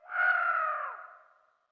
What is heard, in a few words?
Bird Eagle; ambience